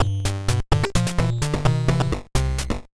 better luck, Short chiptune music sting, for a level restart or failure screen.; Made with TIC-80; better luck next time; road txtr